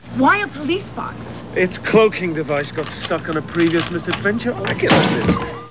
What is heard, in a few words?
cloaking